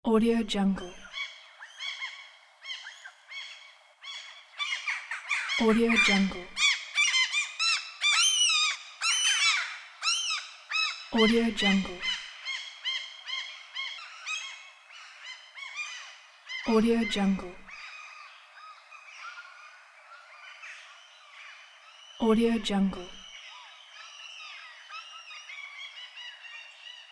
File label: crows and gulls voices